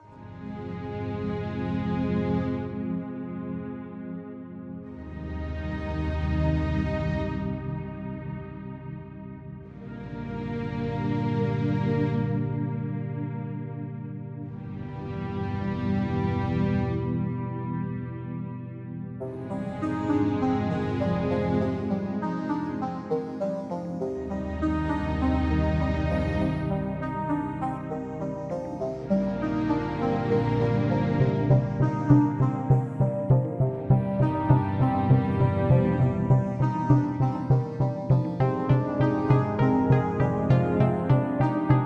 Dissonant Decisions, No Drums

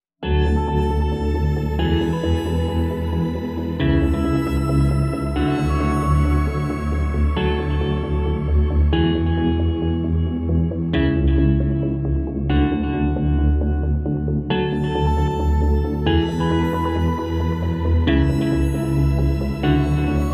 No Drums Mix

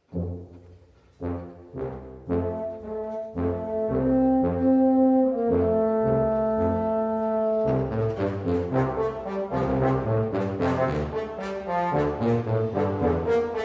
Brassy Jingle Bells

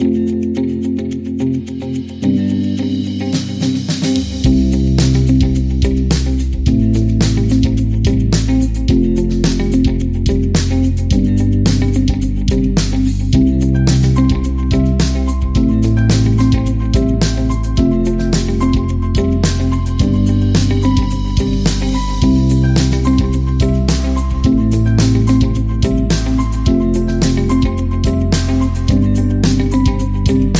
Acoustic Inspiration

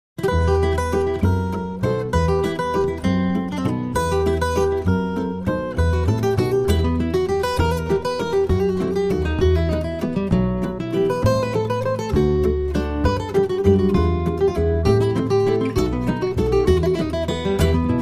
Puppet Waltz

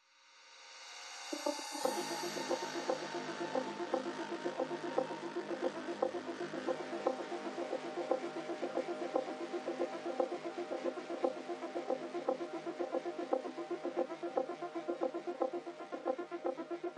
Minimal No Drums No Bass

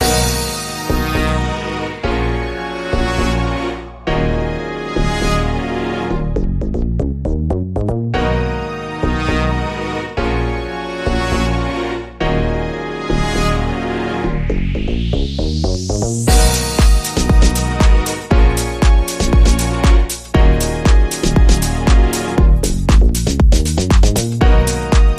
Hungry Horns

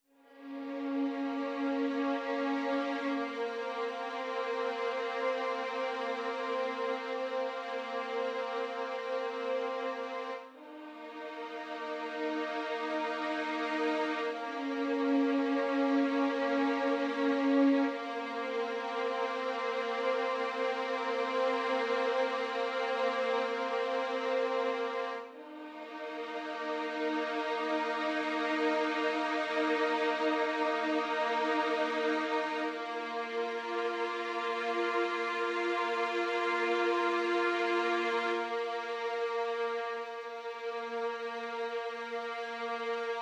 Strings Only

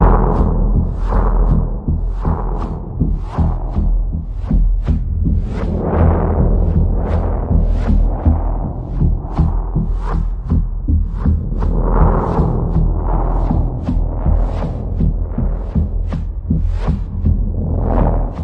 Pulse, Key of E

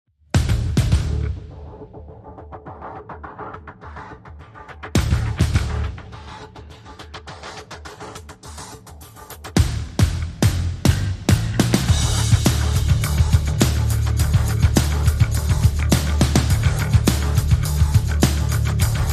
Drums and Bass

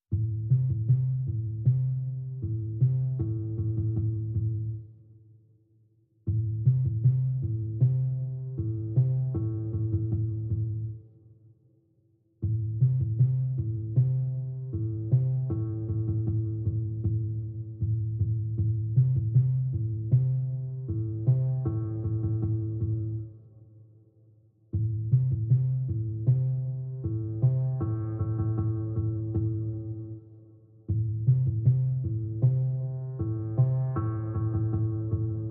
Lead Motif Only

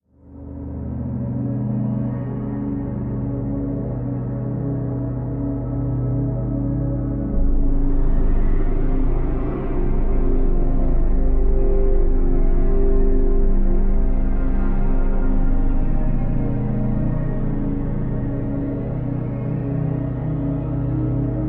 Eerie Drone Light

